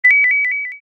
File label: Sound Bonus; Dream Tower